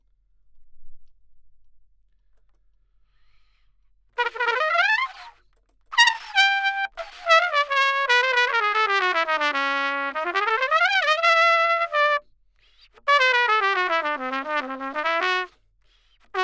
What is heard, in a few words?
Trumpet